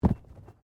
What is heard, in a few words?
Body movement Tumble Light